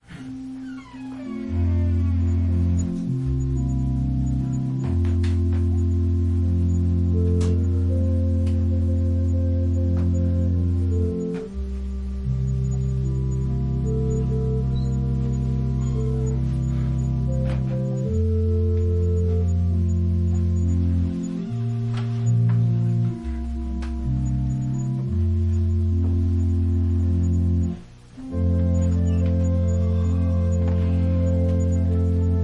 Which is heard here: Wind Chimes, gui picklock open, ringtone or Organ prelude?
Organ prelude